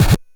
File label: Drums, Hits; DCM8